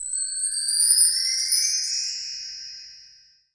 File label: belltree; drums single hits; Western and Latin Percussion